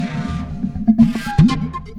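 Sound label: Music Dpad Base 120; from dust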